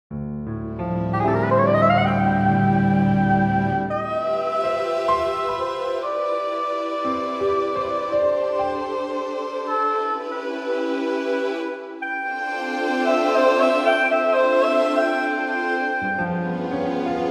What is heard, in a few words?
Soprano Sax; Scoredog